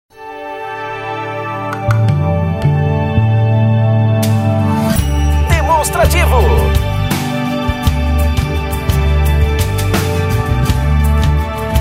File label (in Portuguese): tema espera